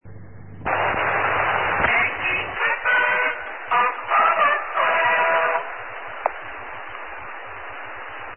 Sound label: chorus